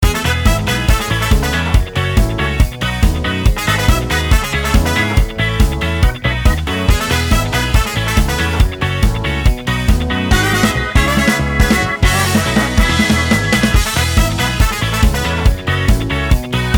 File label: Cheerful; 100% Orange Juice; Saki